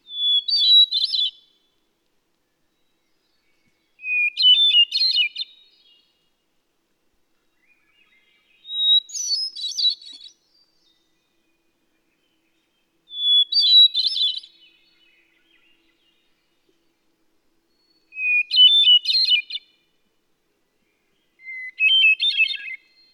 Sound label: Hermit Thrush Sound